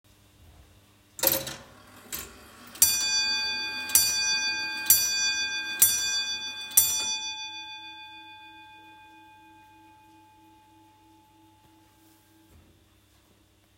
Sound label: Comtoise; Champ a Thurin